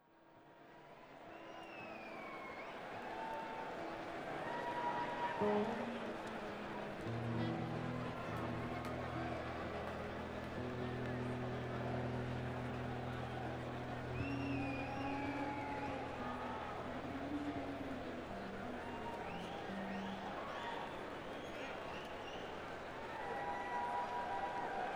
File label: Crowd; Las Vegas, NV - MGM Grand Garden Arena